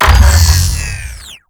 gauss siege stop; doom gauss

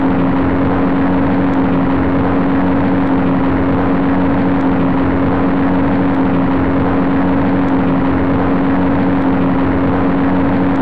ferrari f430

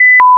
sentry disengage; snds jack gmod

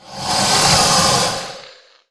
worm striking poison

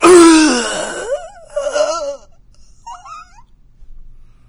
zombie death; doom3; fatzombie

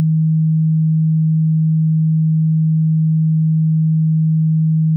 microwave gun hum

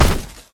step

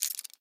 pick movement, lockpicking

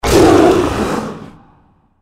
deathclaw death; npc

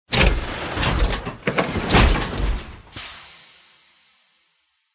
exit, power armor; clothing system

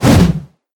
swing whoosh

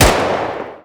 tiger mg; weapons

